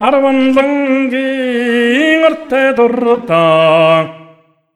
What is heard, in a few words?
MONGOL V, Asian Traditions; USB Soundscan vol.60; AKAI